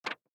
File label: hover drawing